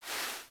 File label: Foley Idle Paw Swipe; Enemies; Kubrow; Warframe